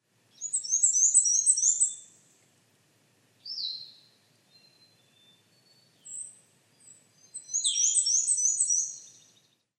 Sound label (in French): paruline noir et blanc